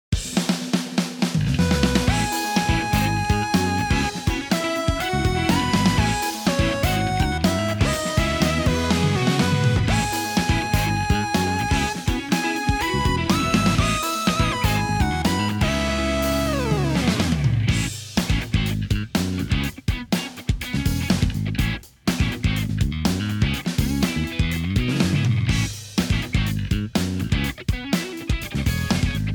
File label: backing track; G.O.D. COMPETITION素材